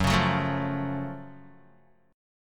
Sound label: Gb Minor Major 7th Gb, down, Guitar; strummed; JGuitar